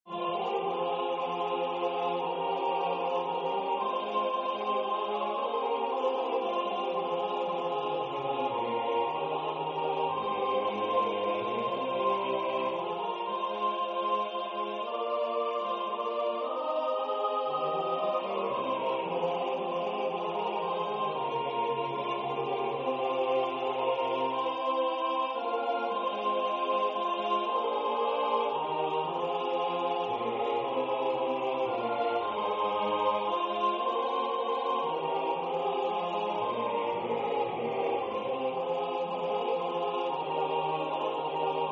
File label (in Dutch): tutti; Kristallen den fina